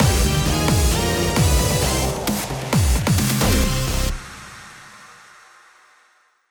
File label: Win Jingle